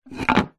swimming pool skimmer cover stone tile slab on close; household; zapsplat